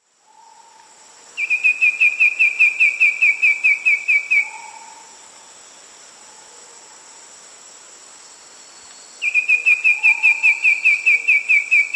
Allpahuayo Antbird; S. America

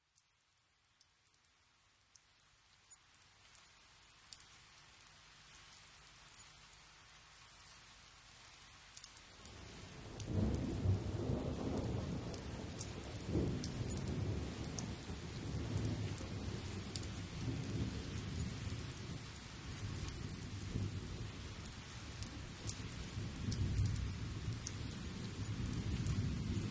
Thunderstorm IN THE FIELD

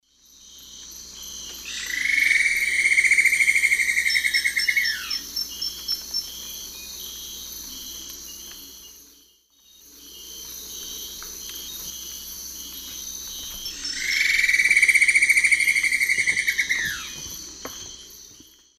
Dendrexetastes rufigula, song; S. America; Eastern Lowlands

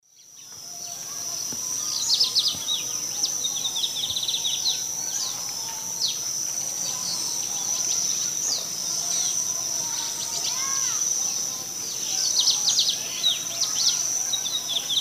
Sporophila lineola, calls and song; S. America; Eastern Lowlands

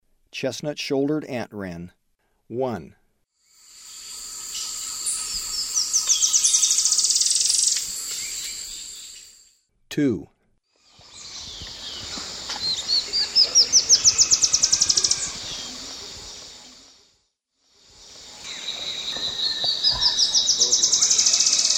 Chestnut-shouldered Antwren; S. America; Eastern Lowlands

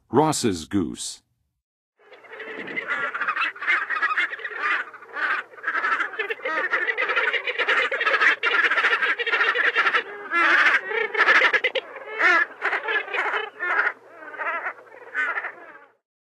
Rosss Goose, Songs of Birds of North America; Western USA; Western USA Songbirds

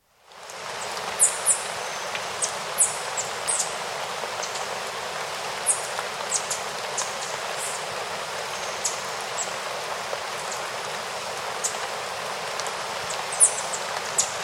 Tawny-crested Tanager; S. America

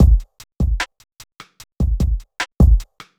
hiphop

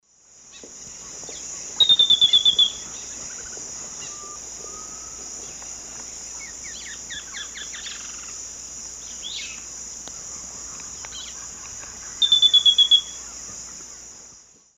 Laterallus exilis, song; S. America; Eastern Lowlands

